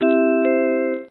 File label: gong; rozklad; zapowiedzi